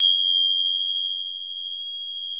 PRC, Finger Cyms; Roland LCDP03 Orchestral Perc

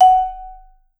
Vibes; Sampleheads; Dave Samuels; Marimba & Vibes

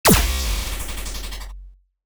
scifi marksman sound

